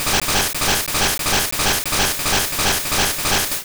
Hydro Pump; Pokemon; Gen 2; attack moves; GSC

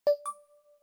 Charging Started; android 11 ui sounds 2020

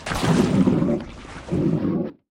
Slime Kill Player

